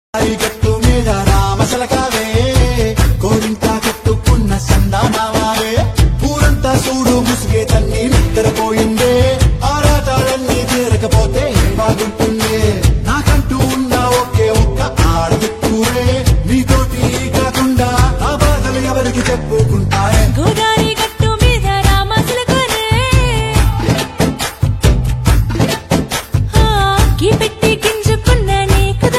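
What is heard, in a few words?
song ringtone, Telugu; sankranthiki vasthunam; aishwarya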